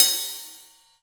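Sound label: hi hat open; E-MU Producer Series Vol. 7 – Old World Instruments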